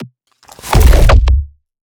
Piercing Snowflake, bass

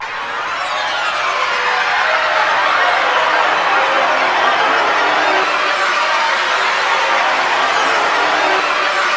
MINIMAL; Sound Gallery - Stack Ware

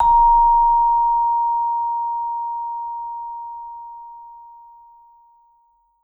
Vibes, A#4; Sampleheads - Dave Samuels Marimba & Vibes

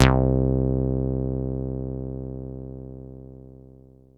303-Style single hits

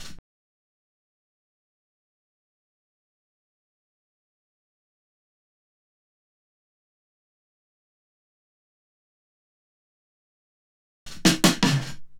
Roomy Roll, Fills; Zero-G Smoke

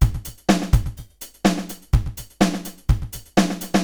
80s delay Akai XE8 (125BPM), cyborg beats; musicradar

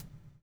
BUBBLE; Spectrasonics - Backbeat

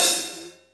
HH OP, Drum Dry; East Collexion; Drum 1

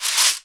SHEKERE, Drums&Percussion; E-MU Producer Series Vol. 7 – Old World Instruments

